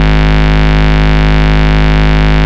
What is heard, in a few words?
Bass, Root G#; Vengeance Samples